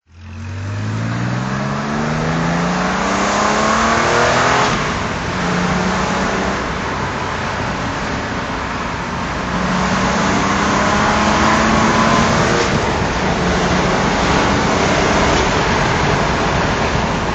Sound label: porsche 911 24t drive